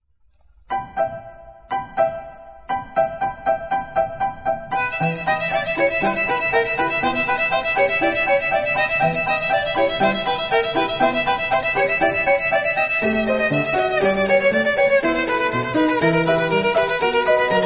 скрипка; А. Глазунов; "Град" из балета "Времена года"

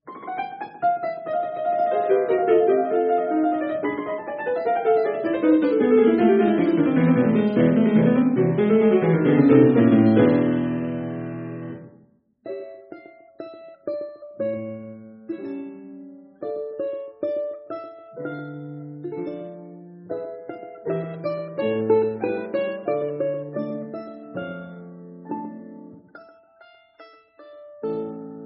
домра; Играют учащиеся и выпускники; А. Цыганков; Плясовые наигрыши

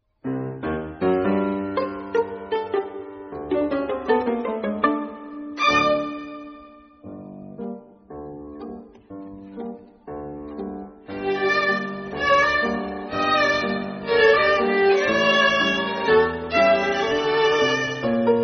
Ансамбль скрипачей, Фольклорная композиция "Восточная"; Канифоль

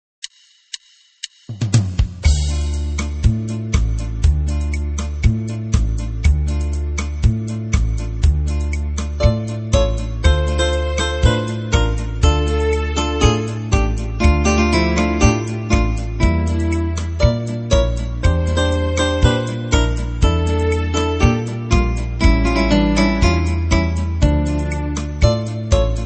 синтезатор, Хабанера; Ж. Бизе; Хабанера из оп. "Кармен"

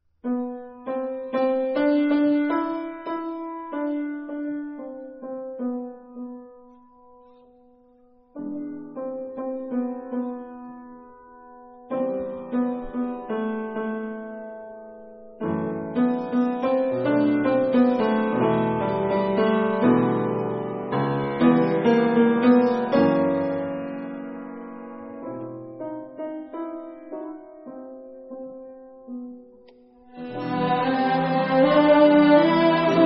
Ансамбль скрипачей; Играют учащиеся и выпускники; Вдохновение; А. Бабаджанян; Ноктюрн

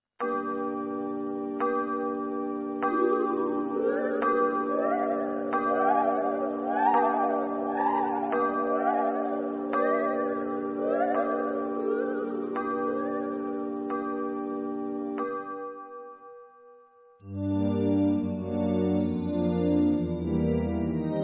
синтезатор; М.Виттне; Колдунья

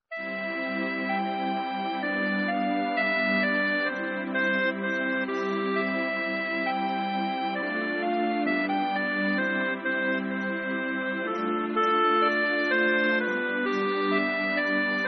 синтезатор; Обр. РНП "На горе-то калина"

